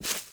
grass, footsteps; player

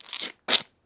foot scuff concrete

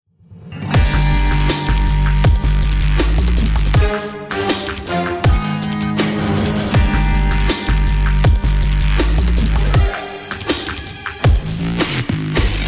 start action, music

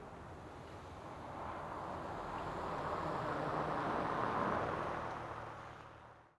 office outside driveby; ambient; atmosphere; Counter Strike Model Pack; CS:GO

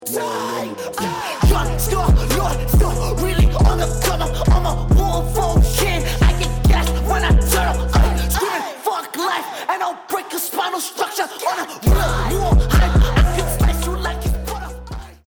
start action, music

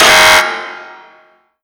ding, elevator distillery

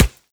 sand, bullet impacts; Half Life Model Pack

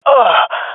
death, swat fem; Counter Strike Model Pack; CS:GO

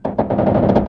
metal box strain; physics

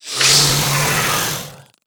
threaten, headcrab black; creatures; vox

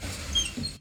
bank doors move

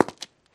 footstep concrete

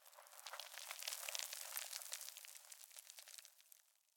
rockfall; ambient; dust2

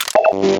battery pickup; Half Life Model Pack; Half Life 2; items

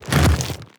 step movement, zombie blind, foley; creatures

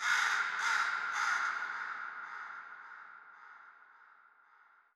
crow, ambient, nature, birds; Portal 2

